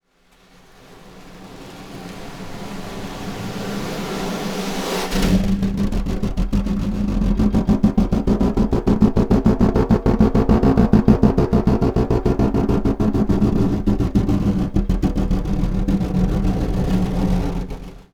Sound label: Snare Drum; Percussion Samples